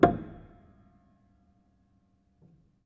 Hamm Perc, Piano Hammer Perc; Piano Miscellaneous

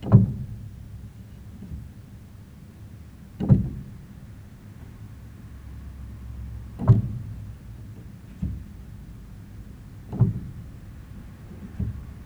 Hammer Rub, Piano; Piano Miscellaneous

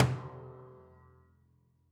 Body Thump Sgl, Piano Destruction

